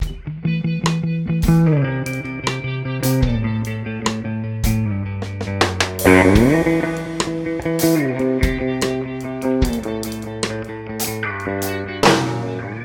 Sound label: loop guitar